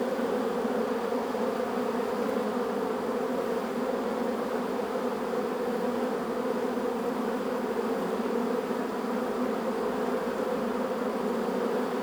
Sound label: bees hive exterior in front of landing zone from 1 meter; Beehive; stereo ORTF 8040; SoundHolder